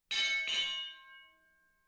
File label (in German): rundenglocke - 2 schläge; Sport